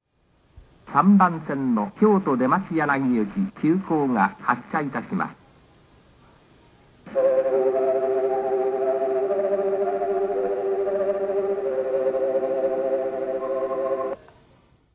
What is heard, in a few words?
yodoyabashi melody, station; keihan